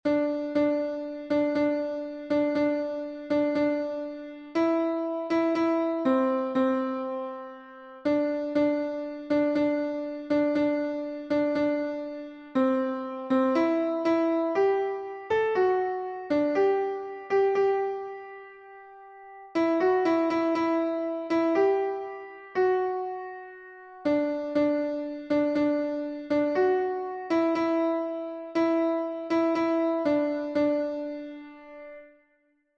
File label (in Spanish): contralto, himnos en piano; 49 TE ADORO PODEROSO DIOS; cantos del camino